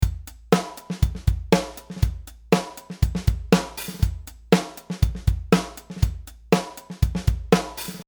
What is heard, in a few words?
Buzz ghost, ghost notes